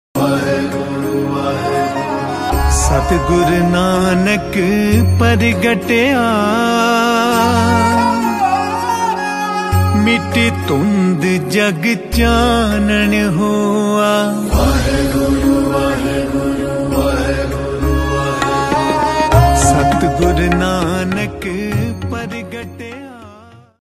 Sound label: panjabi devotinal ringtone